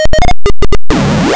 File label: got part sound